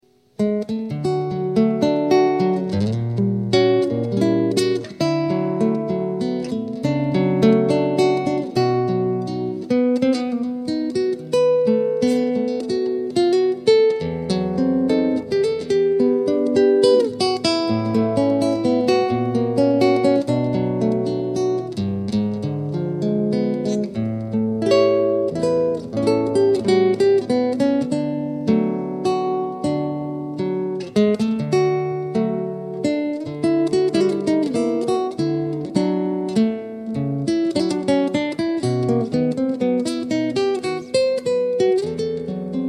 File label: Nocturne Solo